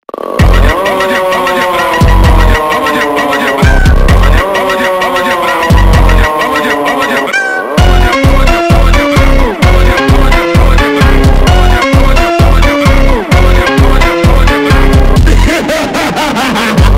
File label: FUNK AAAHHH; PLANKTON